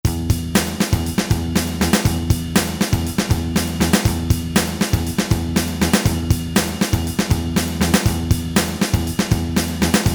Punk

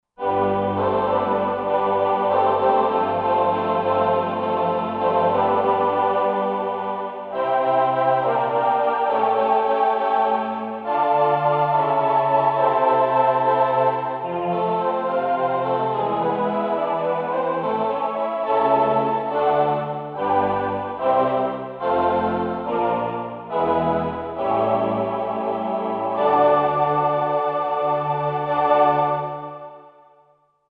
VP-330 Choir, vintage synth; Roland SR-JV80-04

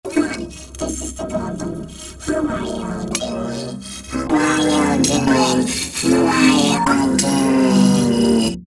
Sfx Goblin Talk, Alesis Micron; Factory Programs

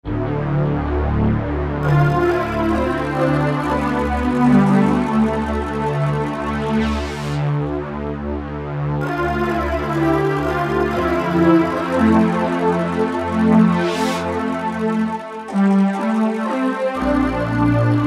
RATTLE PAD, Roland SC-880; Factory Patches

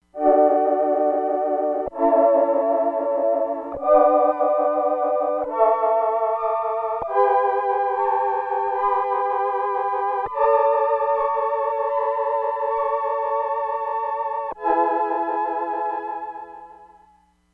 FEMALE SPACE CHOIR, Crumar Bit 99; Factory Programs

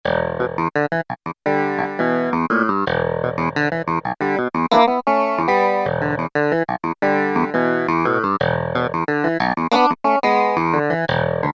Clav, Roland SR-JV80-01 Pop

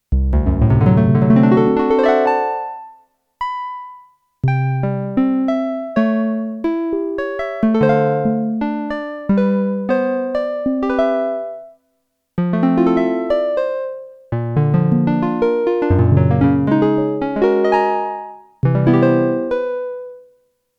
Korg DW-8000 HARP, Factory Patches

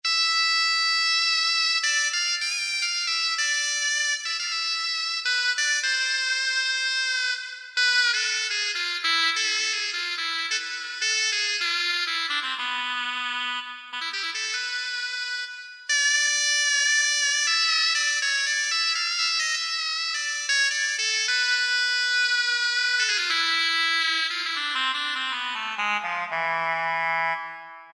Mizmar; World; Roland SR-JV80-05

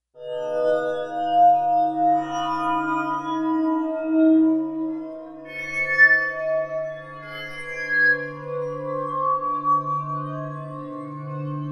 ICE PHONE, synth

